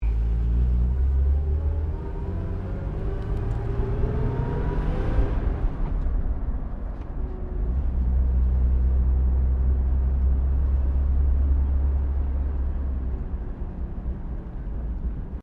CAR HOLDEN HD PREMIER INTERIOR ACCELARATE, Classic Australian cars; Holden Hd Premier 1965; Vehicles